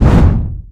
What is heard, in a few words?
searing arrow; weapons; dota; clinkz